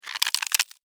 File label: Insect, Movement, Crunch, Bug; Sound Effects:Animals:Insects; Blastwave